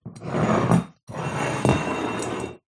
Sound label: foley large empty clay demijohn drag on tiled floor